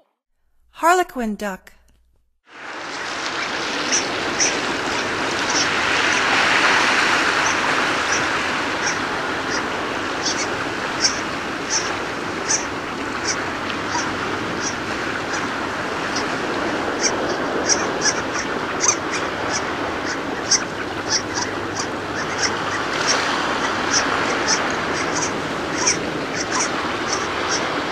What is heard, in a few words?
Harlequin Duck; North America; Stokes